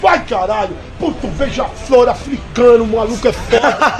beija flor africano

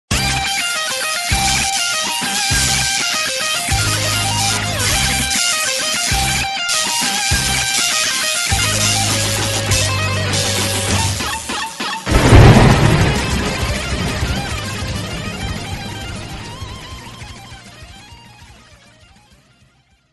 raio

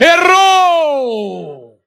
errou efeito sonoro

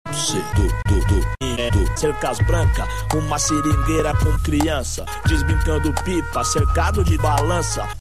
risos

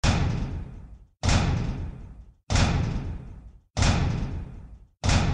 passos de metal

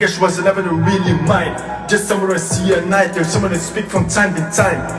voz angelical

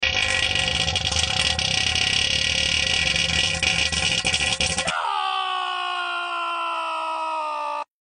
gato auughh

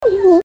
peido baleia

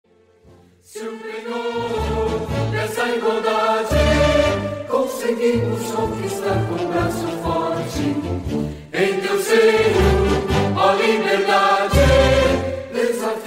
hino nacional

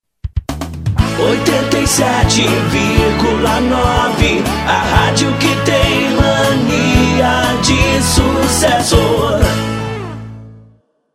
vinheta cantada

